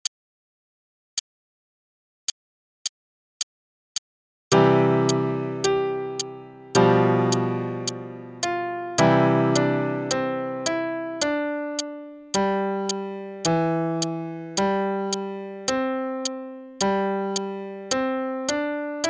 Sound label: Trumpet Voluntary (student part, qn=108); Clarke; Faber Adult All-in-One; Adult Book 1 (All-in-One)